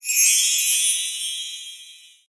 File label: jingle command success